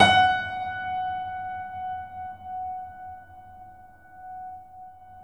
F#, piano sustain; InVision Interactive; The Piano

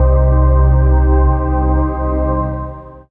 PAD, Dance Mega Synth; Best Service

